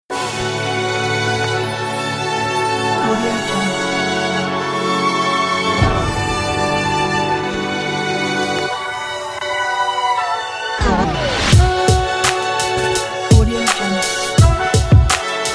positive lofi hip hop

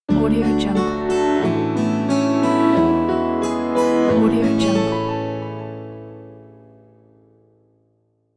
nice acoustic logo

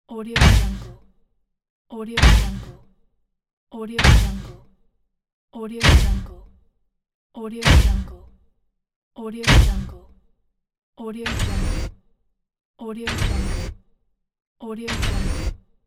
mech steps

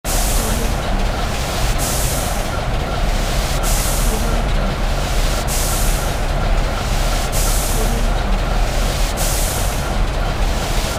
steam engine

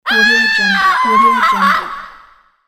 woman screaming

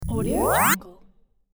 retro 8bit video game warp sound

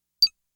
blip tone single high pitch synth blip staccato

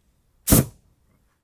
fire whoosh; prosoundfx

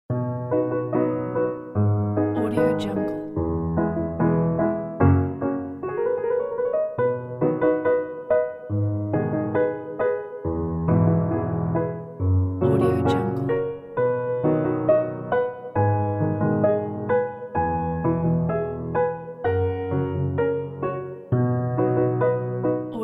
comedy piano; comedy piano no2; soundrose

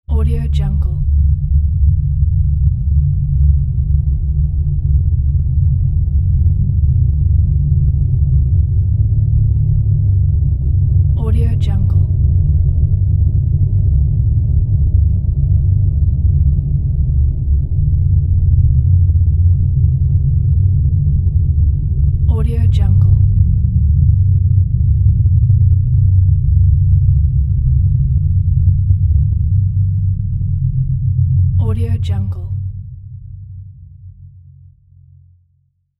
low frequency bass noise

